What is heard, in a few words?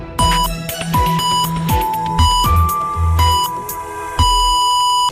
jingle